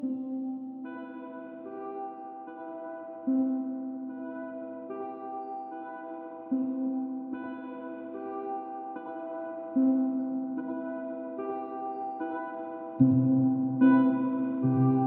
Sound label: mine music